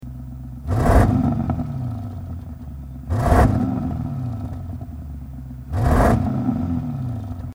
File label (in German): Standgas Klappen geschlossen, audi rs6 c8 abgasanlage; stoll sport